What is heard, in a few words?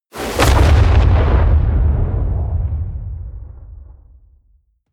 detonation